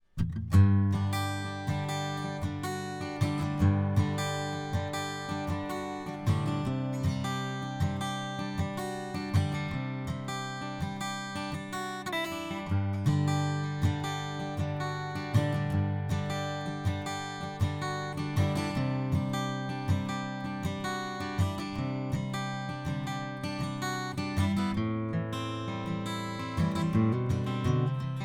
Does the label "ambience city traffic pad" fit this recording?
No